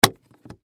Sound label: Camera Case, Camera Case Unbuckle; Latch Pelican Professional Hard Shell Plastic; Technology; Cameras; Blastwave